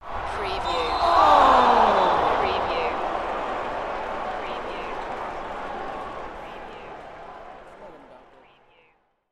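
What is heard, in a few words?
Arena Crowd Oh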